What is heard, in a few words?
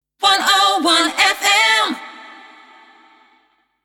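Jingle Acapella 128bpm A minor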